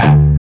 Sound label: CHUG D 1 L, Guitar; East West; Steve Stevens Guitar Samples Collection